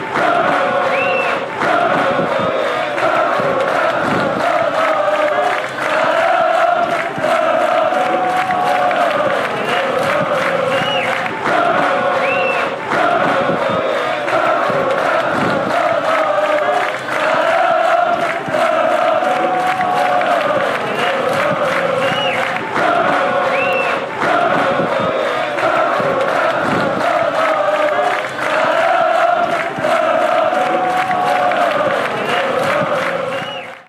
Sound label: woah, fan chants; czech republic